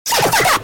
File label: speeded up, speeded screams; ishowspeed